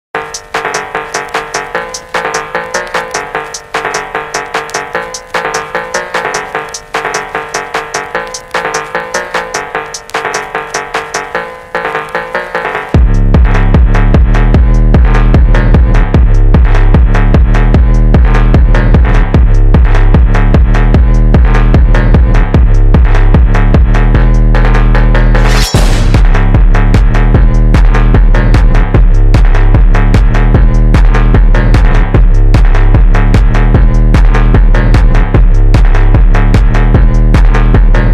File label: Phonk